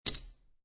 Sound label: Poker Chips Drop One Chip Onto Another Chip